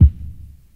Boomy Kick Drum C# Key, kick drum one shot tuned to the C# note; kick drum samples